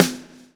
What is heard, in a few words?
LASH, Backbeat